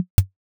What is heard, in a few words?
car exit; vehicles